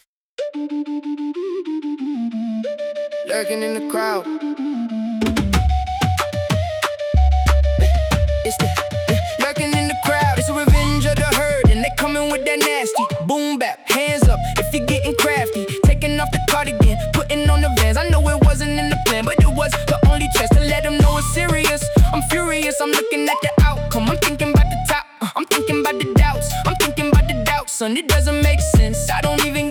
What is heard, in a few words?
Tribal remix